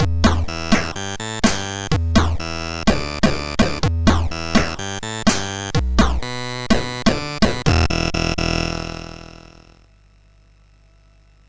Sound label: MOS6581